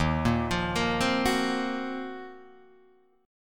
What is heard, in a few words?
Eb Minor 6th Add 9th, down, Guitar, Drop D